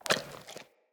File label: Camel Idle Chew; Khaban Camel Vendor; Vocalizations